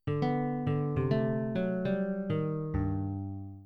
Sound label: Folk Guitar